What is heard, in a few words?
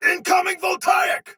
attack soldier sword agent male; Dialog; Barks; Battle; Melee; Ode Tech; Sinecure